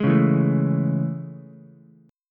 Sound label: A Major 7th A, Ukulele; AM7 strummed; JGuitar